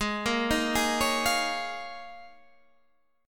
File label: D sharp 7th Suspended 2nd Suspended 4th G sharp